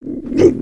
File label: Skullken Idle